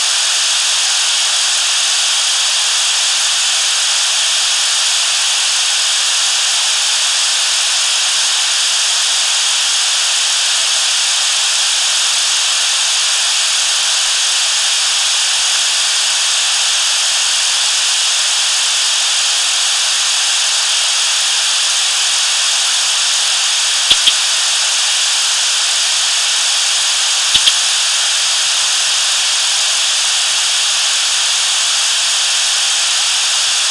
ultrasound of living space

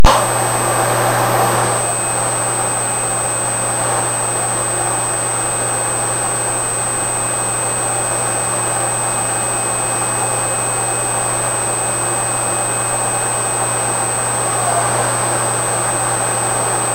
tone center

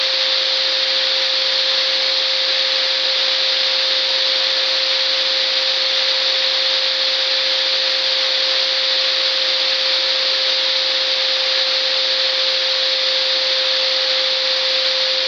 recording sdr 189.236 mhz

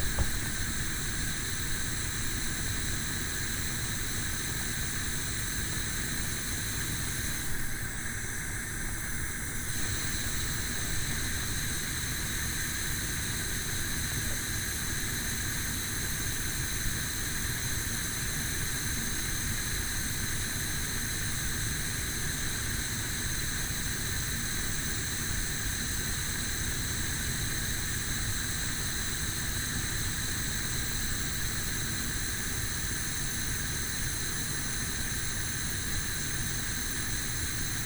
gas flame

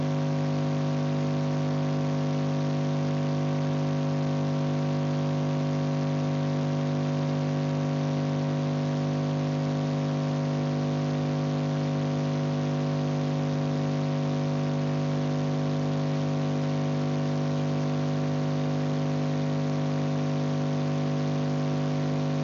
normal em trace from induction coil; new world order

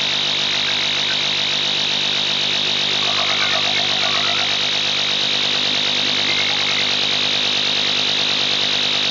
bat scan ultrasound mosquito repellent

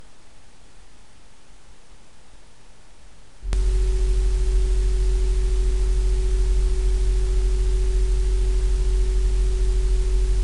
modulating tone; modulating tone & counting 1 to 20

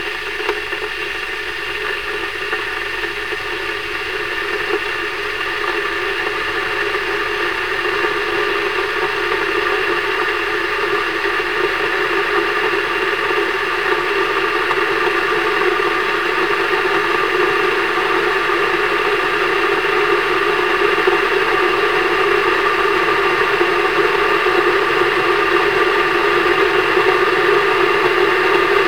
kettle boiling music

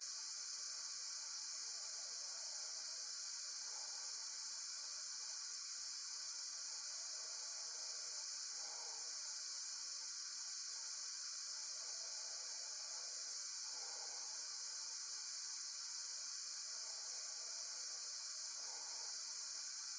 streaming while sleep

